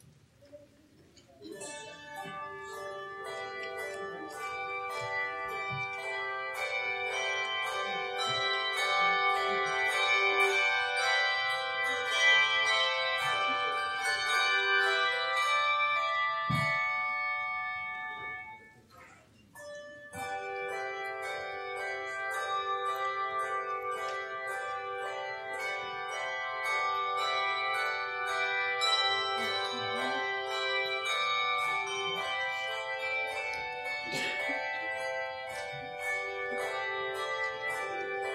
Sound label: bellchoir; This Joyful Eastertide; Bell Choir Performances